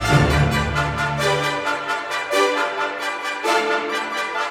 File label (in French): orchestre